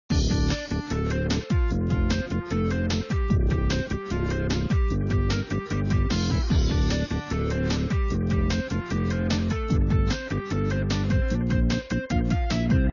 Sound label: race music; Skillzgaming; mega Money Rush Desktop